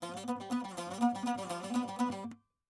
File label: saz